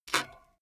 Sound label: bmx bike pedal foot release heavy